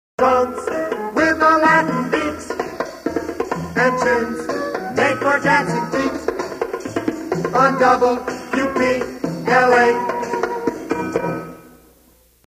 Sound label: Latin beat; WPLA; LangWorth